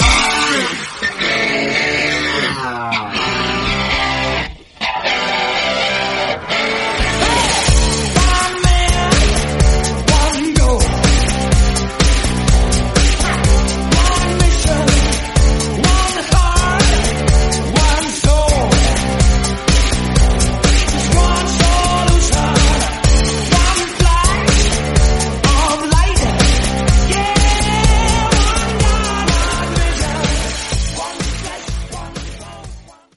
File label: REDRUM, 125 bpm, G, Slam End; 1985